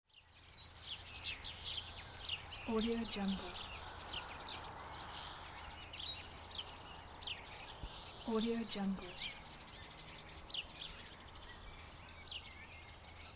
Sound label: nature sounds